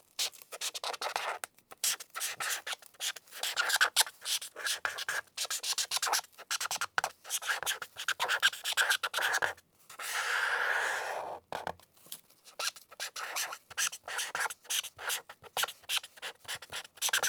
Marker writing and highlighting; Office Equipment and Supplies; Hear and Now Sound

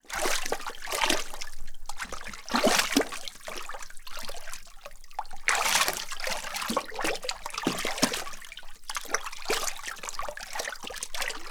Water Splashes; Wav Junction Sound Effects

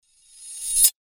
Sword Sound; FXHome; Fighting Sounds

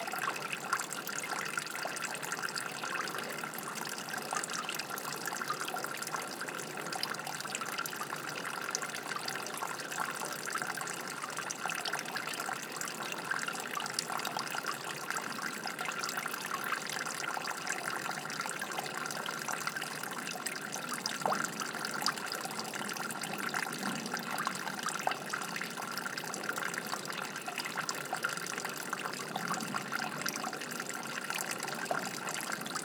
DRAIN Exterior, Street, Light Flowing; Loop; Urban Rain; GainWalkers

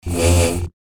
Saber; FXHome

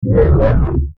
time start or stop; FXHome

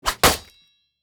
Sword Attack Hollywood

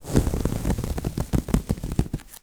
nails on towel single, Fingernail Scratches; Multi-Surface; 3maze

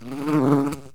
Bumblebee, Close, Fly By; Alexey Sinitsyn; Attack of the Bumblebee

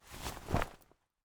ICE Skater cloth move; Articulated Sounds; Ice Skating

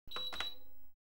Computers, Telex AND Typewrite; Computers Printers Phones; BBC Sound Effects Library

